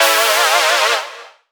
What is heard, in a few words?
Synth Shot; Vengeance Samples